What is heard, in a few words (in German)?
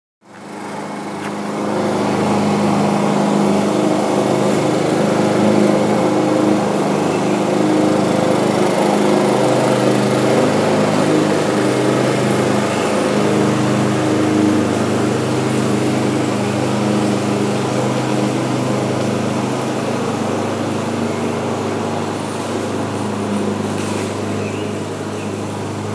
rasenmaeher